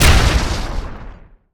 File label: Explo Small Large Energy Rifle; Destruction